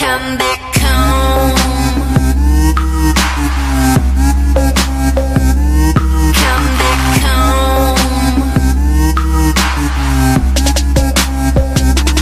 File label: chorus